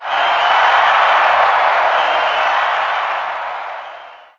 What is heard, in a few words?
crowd cheering